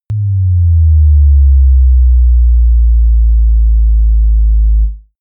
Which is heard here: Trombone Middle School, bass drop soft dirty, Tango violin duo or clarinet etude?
bass drop soft dirty